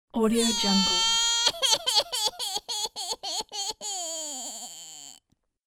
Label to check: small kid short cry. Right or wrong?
Right